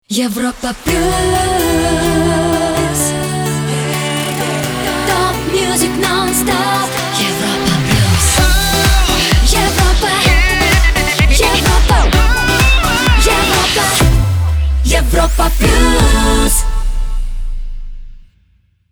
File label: Jingle Main ID; Europa Plus